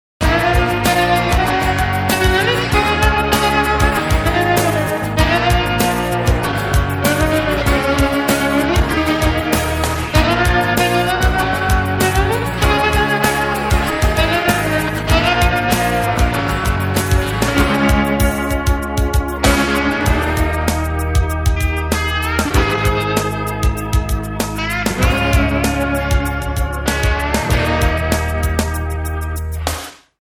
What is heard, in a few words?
ACOUSTIC NOW